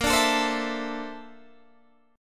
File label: A sharp Major Sharp 11th, Guitar, Drop D